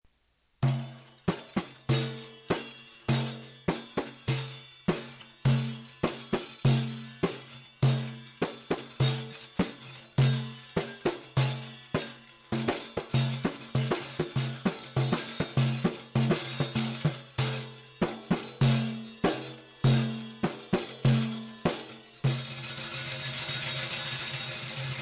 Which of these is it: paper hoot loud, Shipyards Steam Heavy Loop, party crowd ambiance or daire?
daire